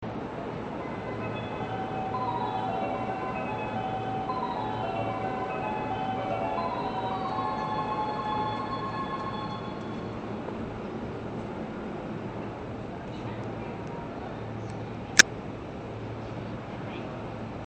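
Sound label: fuzishiro, departure bell; zyoban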